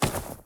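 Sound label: item generic up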